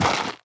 step light, footsteps, gravel; player

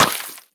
spew, infestation, bulb

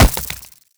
body impact, headcrab black movement

